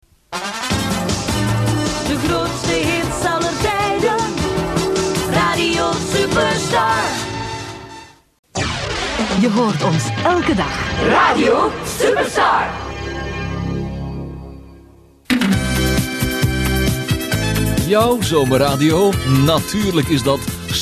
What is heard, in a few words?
jingles; Superstar Gent